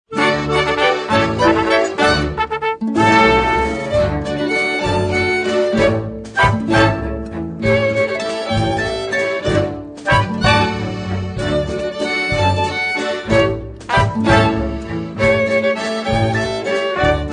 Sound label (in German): Mexikanischer Walzer